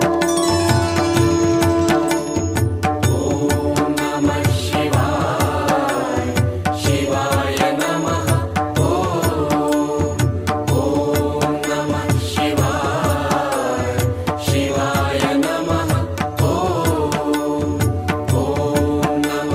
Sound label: Om Namah Shivay ringtone